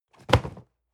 cardboard box medium fall low hard; objekte

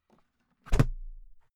briefcase close hard; industrie wirtschaft; bueroarbeit

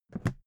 briefcase close soft; industrie wirtschaft; bueroarbeit

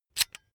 electric drill template adjust heavy in; soundpool

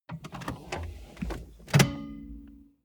car bmw e46 interior seat pull forward short

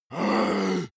monster growl attack deep; kreaturen

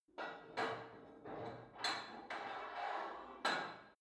put weight onto barbell unsteady reverby; sport freizeit; fitnessstudio

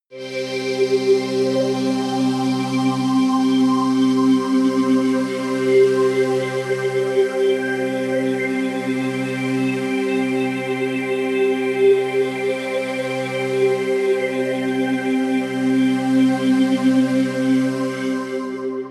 pad sweep glass ring; flaechen

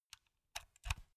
insert usb laptop soft, elektronik; objekte

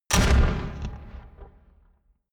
flutter echo; whooshes rises; hits impacts

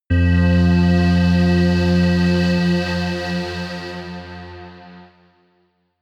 synth sway, drones

